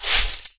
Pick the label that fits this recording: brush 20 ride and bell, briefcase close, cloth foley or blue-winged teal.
cloth foley